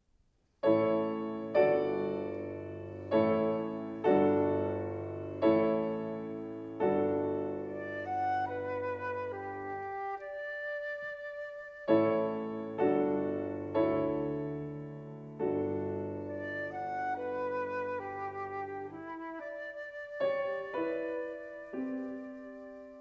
Sonata For Flute and Piano - Mvt. 1. Andante semplice